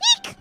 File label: eek